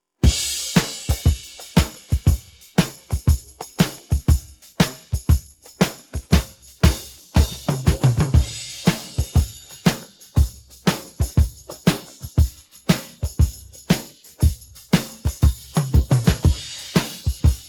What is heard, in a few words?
pattern, drums